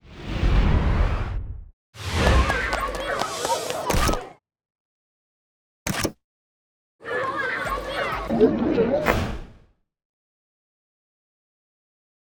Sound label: SFX; Everybody Hates Chris; Launch